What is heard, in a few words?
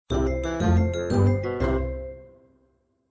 Level completed Jingle; Secrets of Magic: The Book of Spells